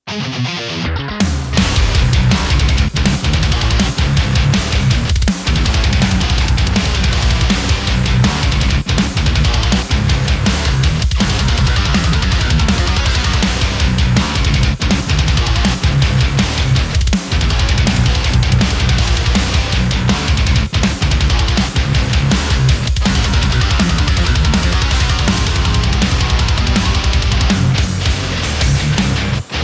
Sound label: Kemper; Higain